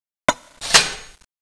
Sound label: tentacles knife deploy